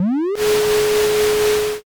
vacuum

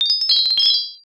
kagura suzu

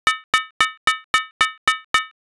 stir

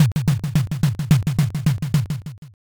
bon taiko

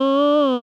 voice oo f

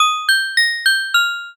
music box

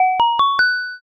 asia xylo

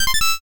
toy beep

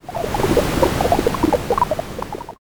underwater

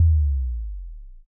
kick

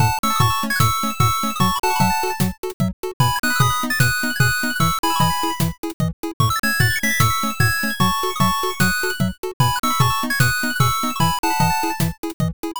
bgm bright

